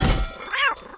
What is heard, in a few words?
cat; Mafie 1